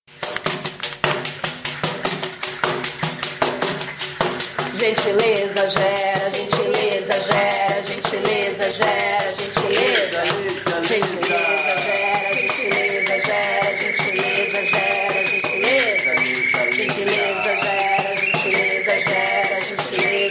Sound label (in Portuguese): vinheta